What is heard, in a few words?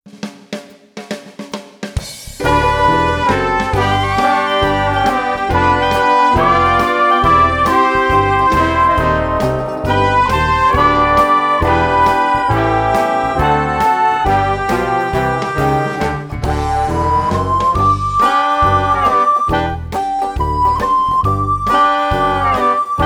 when the saints go marching in concert version full performance